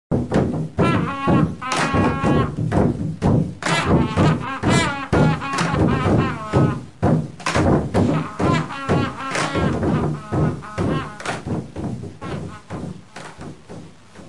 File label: fanfare